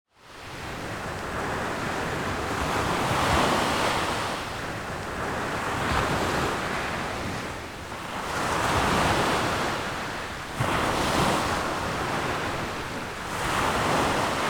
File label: Beach ambience water crashing on the shore